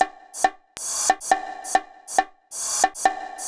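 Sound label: CONGA hi-hat, Techno 138 BPM; Best Service ProSamples vol.54; AKAI; HI ENERGY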